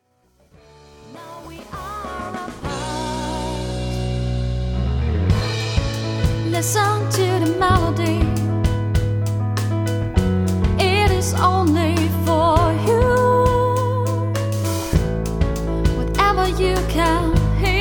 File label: melody